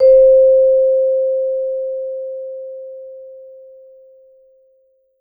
VMED C4, Vibes; Sampleheads - Dave Samuels Marimba & Vibes

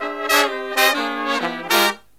Killer Brass Riffs

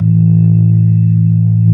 LOW SLOW A1, ToneWheel Organ; E-MU Formula 4000 Series Vol. 3; Analog Odyssey

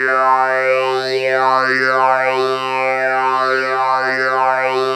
C3, SynthLine

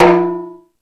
Timbale, E-MU Pro-Cussion

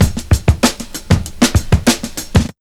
97 DRMS, Underground Hip Hop; USB Soundscan vol.02; AKAI

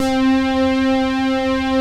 POLY PAD SYN; Pro-Rec - Synthsonic; Z1 PADS

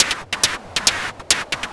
percussion loops, Techno; Techno ID

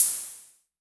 Hi Hats Open; LIMAT Div Dept

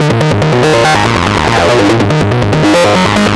ACID LINE; ACID LN

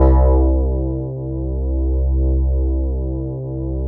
SQ80 FRT, synbrass; Synthesizers

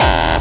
hard bass drumz

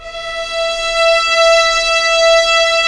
viola E4; AKAI S-Series CD-ROM Sound Library VOL-2

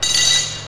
drop, metal & wood; Roland - LA Composer vol. 1

